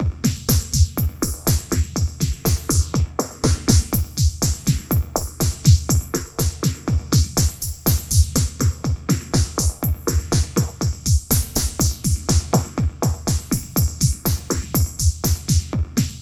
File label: FAZ HOUS, DRUM LOOPS; Zero G; Malice In Wonderland